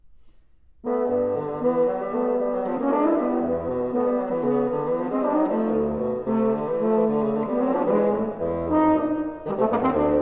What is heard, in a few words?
Duets For Horn & Bassoon; Twelve Duets For Horn & Bassoon - I.